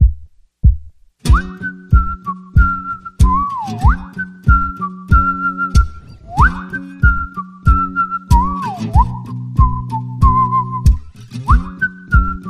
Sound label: Whistle